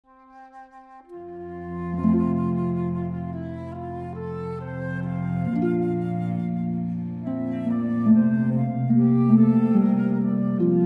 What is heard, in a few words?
Traumerei, Schumann, harp flute cello